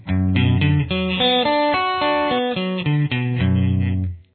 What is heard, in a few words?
Arpeggio Am style, guitar